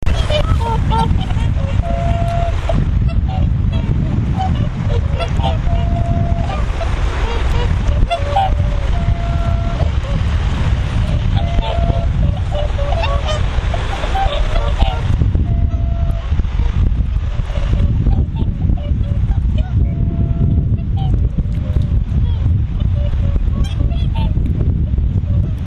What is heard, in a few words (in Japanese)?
hakutyou